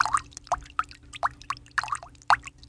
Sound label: Tree Spout